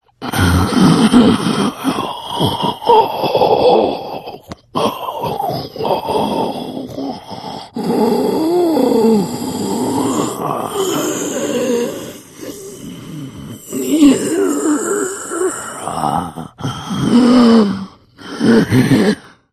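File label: zombie sound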